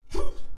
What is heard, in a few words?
Mod Rebuild Grunt; dialog